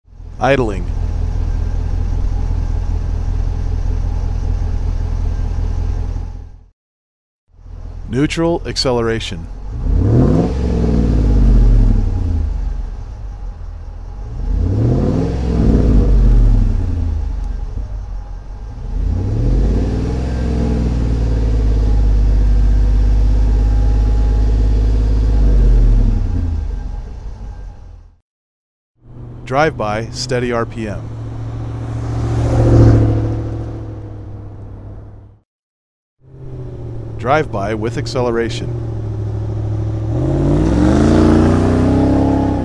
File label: Dodge HEMI sound, aero-turbine muffler